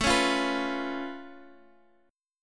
C sharp Augmented 7th A, chord

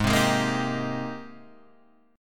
Ab Major 9th Ab

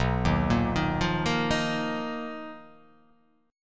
B Major 7th Flat 5th B down, chord shape

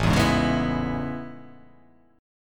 A Major 9th A

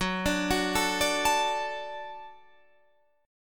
F sharp Minor Sharp 5th F sharp Guitar Standard; arpeggiated; JGuitar

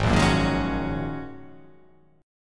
Ab 7th Suspended 2nd Suspended 4th Ab down; Ab7sus2sus4 strummed

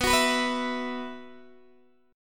B Suspended 4th, Guitar, DADGAD

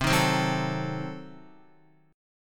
C Diminished 7th C, Guitar 4F,4A,5C,5G,5C,6E; strummed; JGuitar

